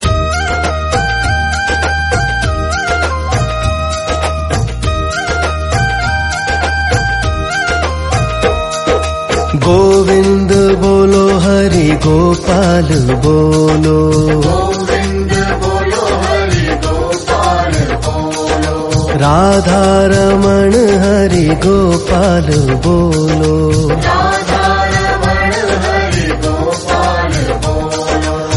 krishna bhajan

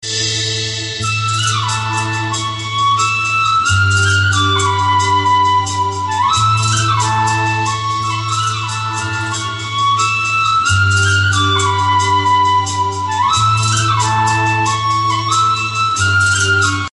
gujarati folk garba flute

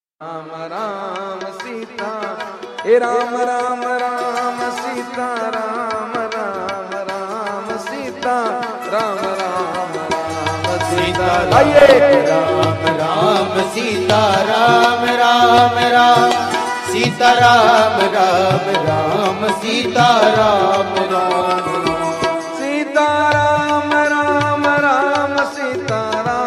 sitaram ram ram sitaram

